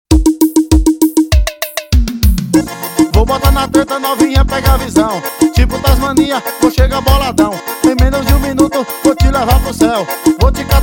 piseiro

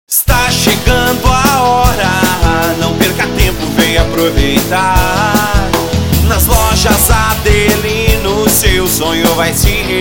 jingle feira; lojas adelino

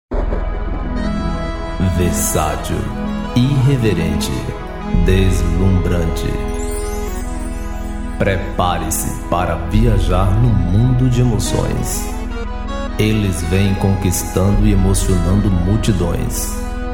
abertura

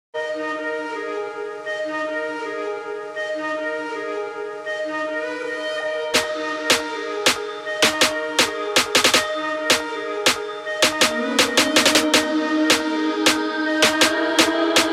remix playback

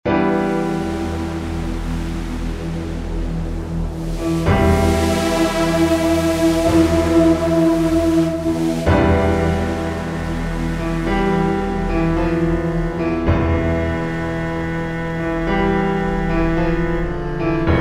suspense trilha

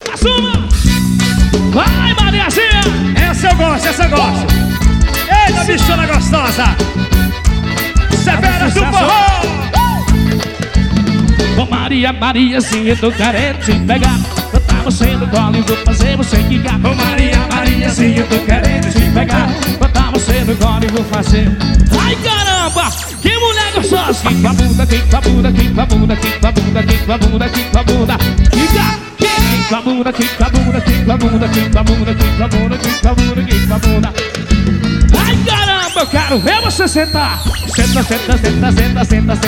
forro ao vivo

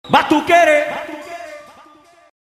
batukere ao vivo

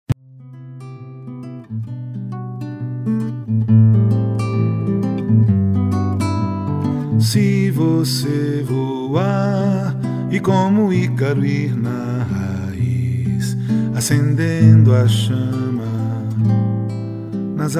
viola

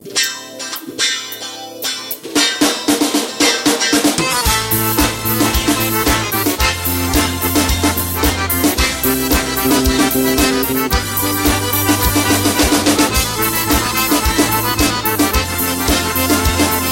abertura

